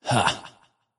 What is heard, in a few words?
laugh, zh; Aphelios